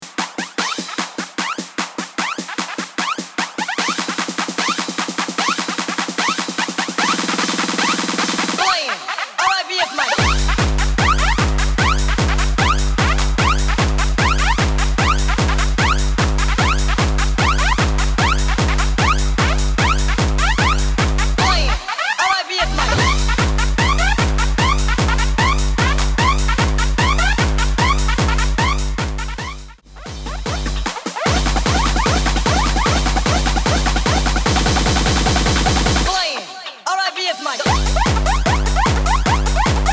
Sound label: Kick drum